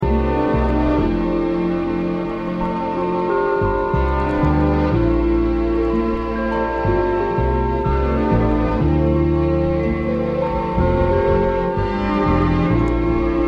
ambient jazz

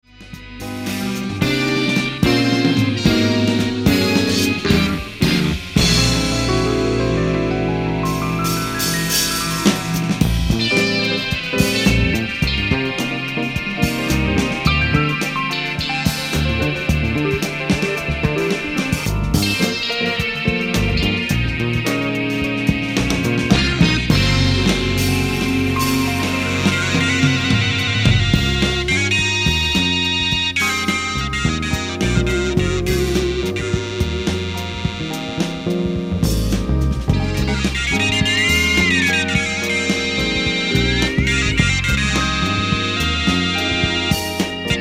Electro keyboard

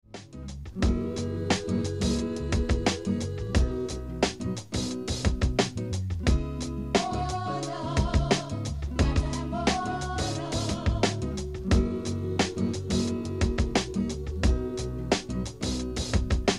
Funky Music Machine

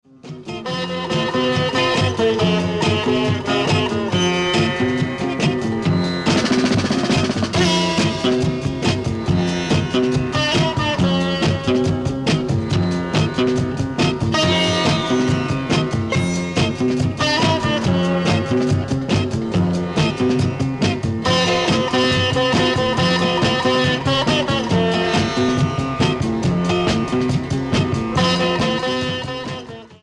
Sitar Blues

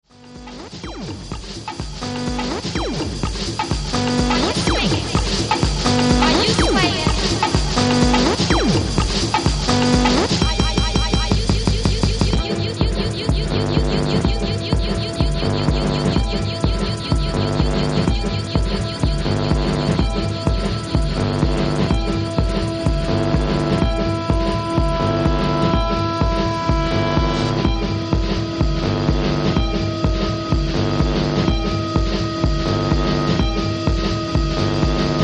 Bongos, Beeps Basslines

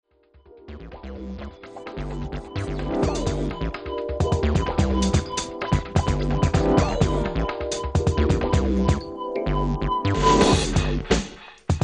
Hi Tech Jazz

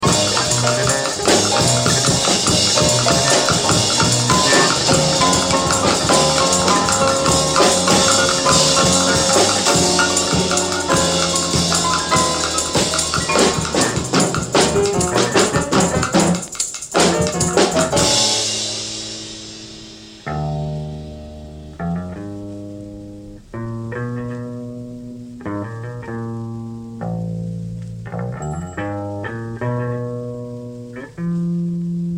mazurka

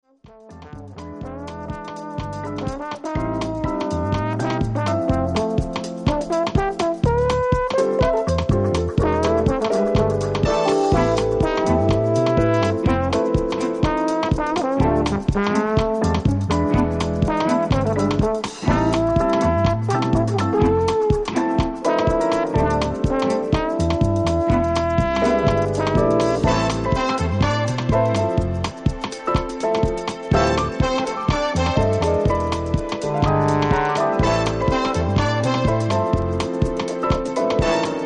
Exotic Drums

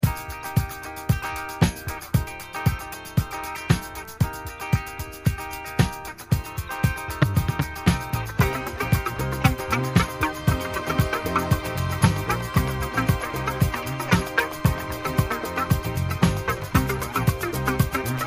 Whistle Bump

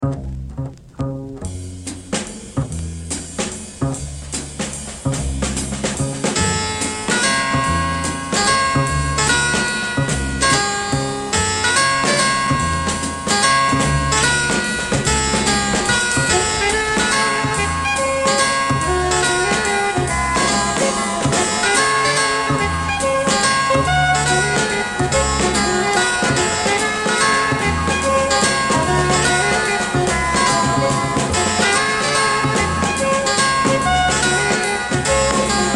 Ciacona F-Moll baroque, jazz goes baroque

